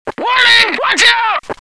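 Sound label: nuclear warning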